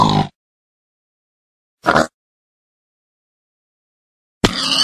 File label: minecraft pig